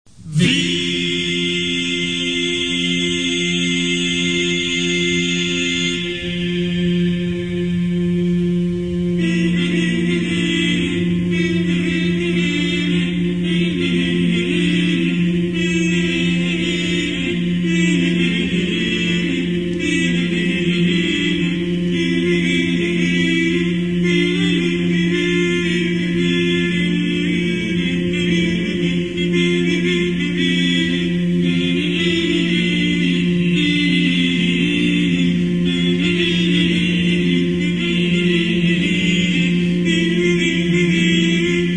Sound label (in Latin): organum